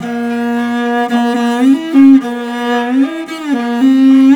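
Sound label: VIOLI LP, MORIN violins, Asian Traditions; Soundscan vol.60; AKAI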